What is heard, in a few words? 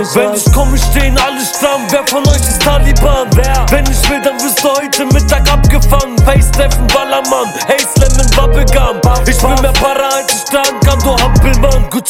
Ringtone